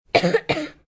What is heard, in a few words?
Cough Dry, Cough, 1 child, sick, chest cold, human voice, kid; Sick Sound Effects